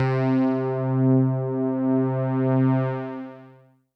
Pad C, Synth Prophet One Shots; Electronisounds

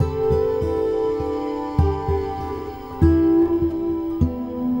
Guitar And Synth

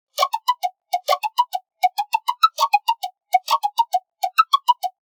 Ghost Pluck

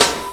SD, dance drums hip

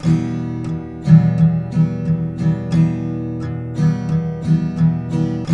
TK MIN F 2, Guitars; Spectrasonics; Hans Zimmer Guitars VOL-1

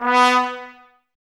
Woodwind Brass Ensemble; Miroslav Vitous

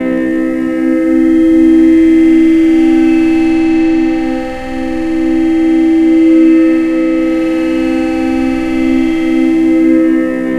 FEEDBACK GT, GUITARS KIT; Big Beat Samples & More; AKAI

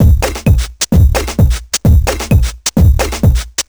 4 floor beat, trance

